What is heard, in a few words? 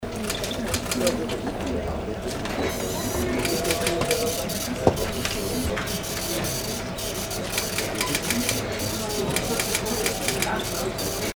office atmo